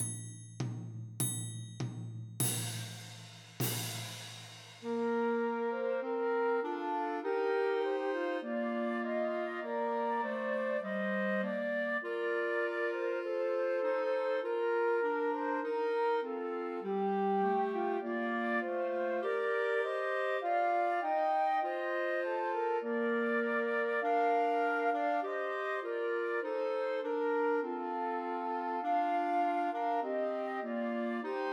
See Amid the Winters Snow Christmas Trios for Two Flutes and Clarinet